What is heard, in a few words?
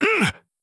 human hurt